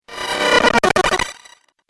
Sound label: Game Glitch Effect, Video Game Sound Effects